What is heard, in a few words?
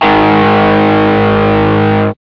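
GUI DIS, distortion guitar; Sound & Vision; Gigapack I CD 2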